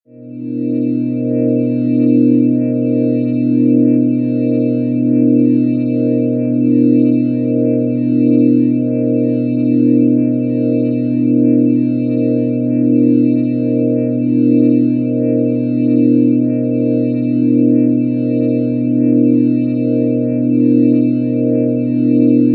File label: organ pad atonal